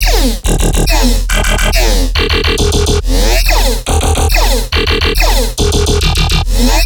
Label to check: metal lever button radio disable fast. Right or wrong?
Wrong